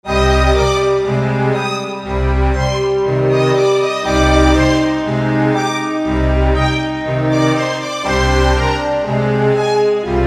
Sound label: loop orch plug; songsnacks